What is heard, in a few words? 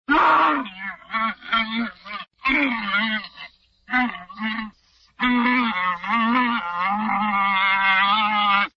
hamster; animals